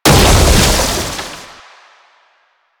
Scythe Proj Die; Stalker Jade Scythe

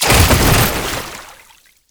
Body Fall Water; Enemies; Warframe; Tau Dax

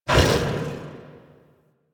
Axe Weapon Idle; Warframe; Tenno; Wolf Frame

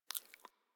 foley mouth move; Enemies; Warframe; Catbrow

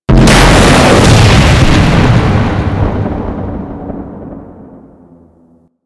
Archwing Space Explosion; Explosions; Warframe

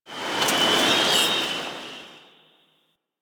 Destruction Space Wind; Ambience; Warframe; Corpus Railjack

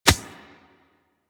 Beach Ball Floof Bounce Dirt

